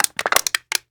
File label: Soda Can Crinkle; Crashes; 1001 Sound Effects